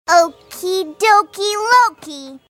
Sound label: suspicious